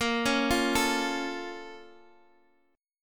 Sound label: Bb Minor Major 7th, Guitar, Half Step Down; chord shape